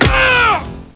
yell; Nukem3d

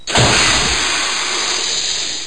empty recycle bin; 688 theme; 688I

